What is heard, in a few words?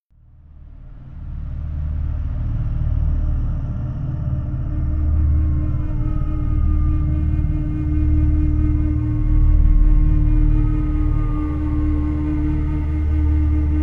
Cry, Native American flute